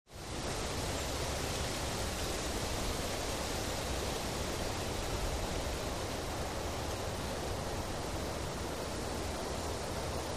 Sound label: Rural Ambience, trees & gusts